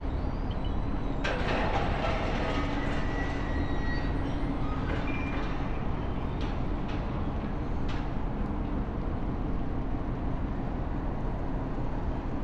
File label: port at night; ventspils